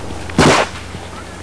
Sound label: Rifle